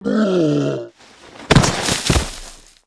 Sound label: bossmonkey die; Eft